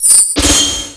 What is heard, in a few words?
ICEHORN attack